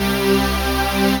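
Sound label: Rave Synth, Dance Mega Jungle Rave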